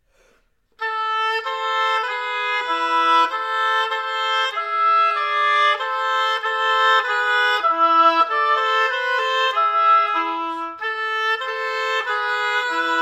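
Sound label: gavotte oboe duet; witthauer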